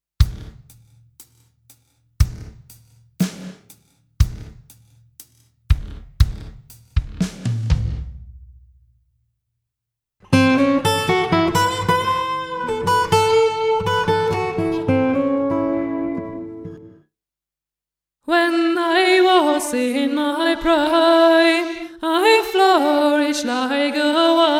RMX16 Reverse

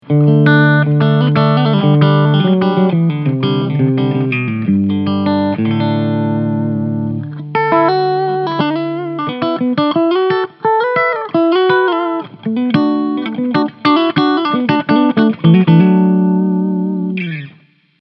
Clean

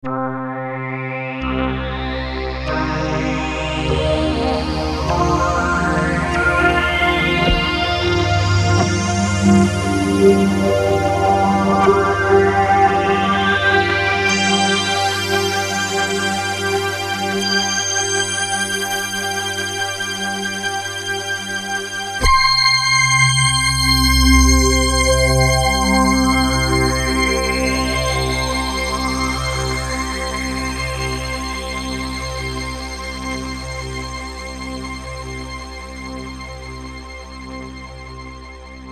Polysix

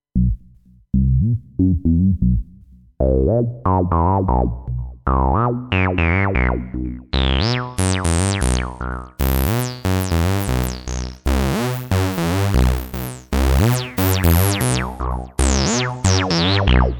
Lancet demoseq

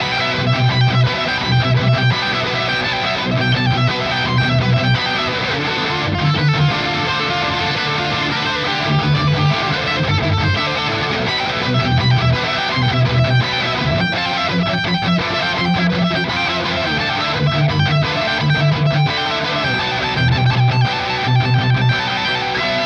Signature Gain Neck Pickup; Sterling By Music Man Richardson

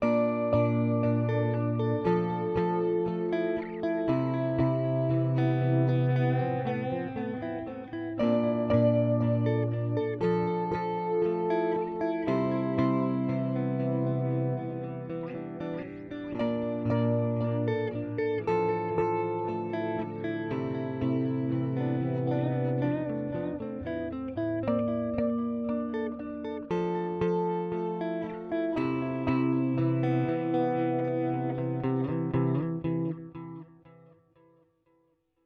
Starlight Echo Station Tape A

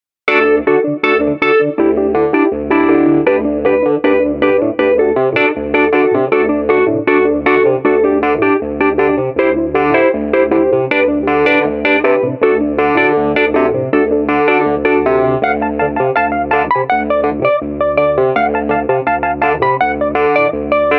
Nord Grand Wurlitzer customized

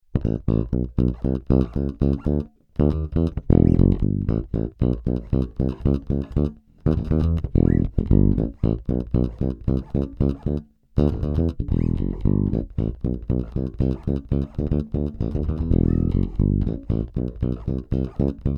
SpaceBass active Finger

